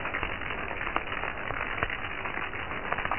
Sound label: Crack; Buggy; PS1